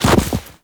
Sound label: Walk Deep Snow, Footsteps; Warframe; Operator